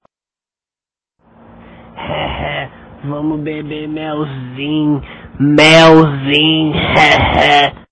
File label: hehe